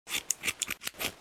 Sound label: haircut